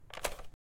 FSP9 ADS In

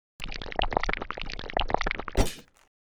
Pocket Item Respawn

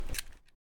ADS Enter

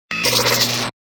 Jailbird swing

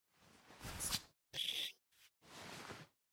Syringe

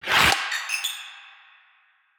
gift open

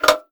Swing forth

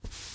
Equip; SCP-1576

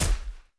Silencer

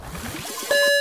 Powerup; Disruptor

